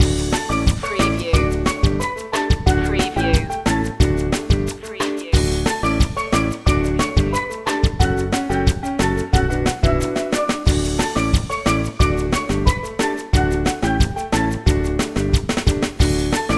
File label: retro video game bubbles loop